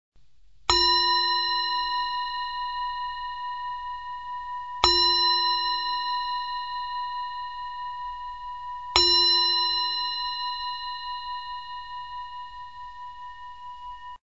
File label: ringing bowl